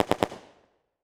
Weapon Machine Gun World War II Historical Short Burst Distant; AdobeCC